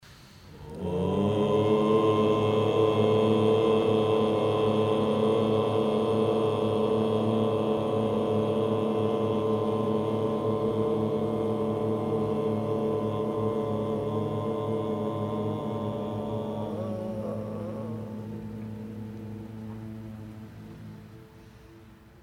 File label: Oum 1 Time